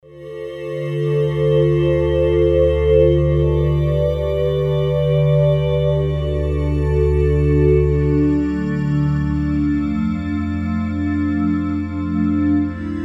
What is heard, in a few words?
Glasswaves, Roland JV-880; Factory Patches